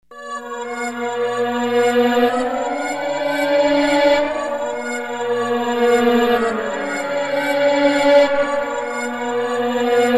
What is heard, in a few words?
synth Detuned Reverb